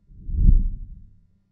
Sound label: menu back; freepixel; WordJourney